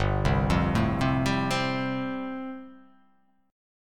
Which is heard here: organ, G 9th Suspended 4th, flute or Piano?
G 9th Suspended 4th